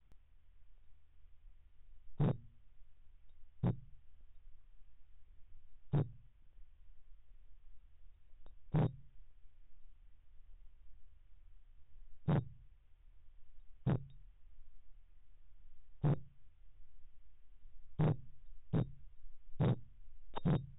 Rhinecanthus rectangulus Drumroll

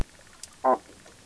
Halobatrachus didactylus croak

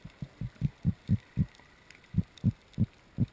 Epinephelus striatus Alarm

